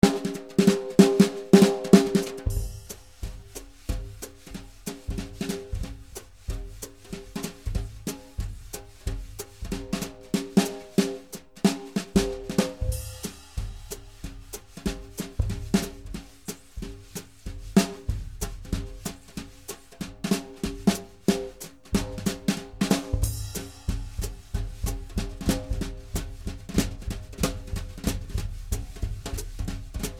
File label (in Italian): Batteria